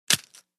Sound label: Candy Bar Shrt Bite